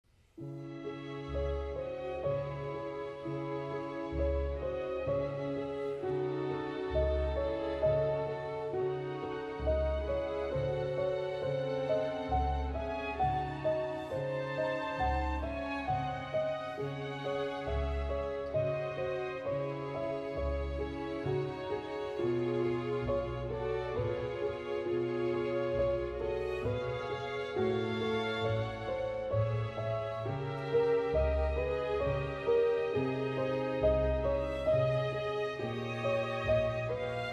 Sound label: Keyboa, J.S.Bach - Vivaldi Concerto in D Minor; Bach Complete Solo Concertos; Sondeckis; Siciliano